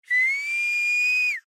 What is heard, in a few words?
Whistle Mouth